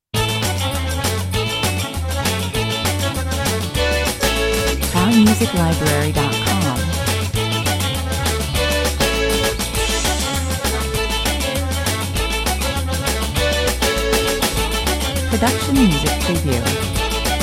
Uptempo Cajun / Zydeko, with accordeon top line, 100 bpm